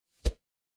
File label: ui swipe